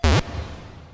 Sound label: ball; Pokemon Red Blue; first gen; gamesoundopedia